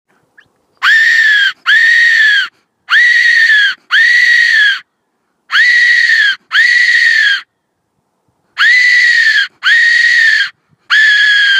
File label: Best Fox Call